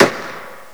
mp40 attack; WAR

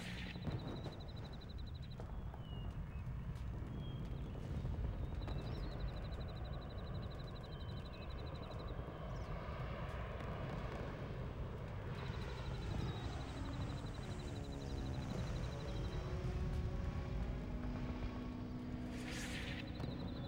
village dam light; WAR

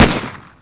luger; WAR

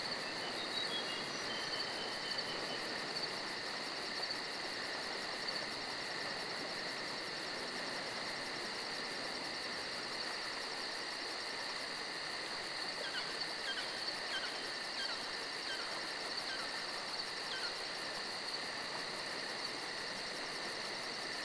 crickets day water; WAR

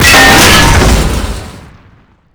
tiger destroy; WAR

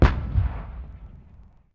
distant gun; WAR

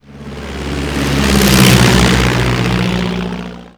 henschel pass; WAR

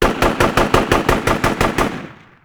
mg burst; WAR

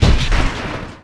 destroy boom; WAR

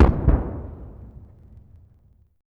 distant gun 105mm howitser; WAR

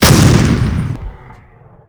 20mm fire; WAR

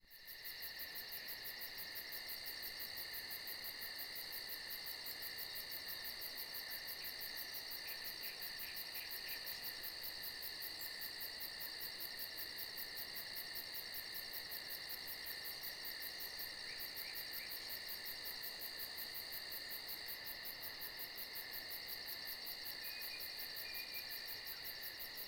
no battle; WAR

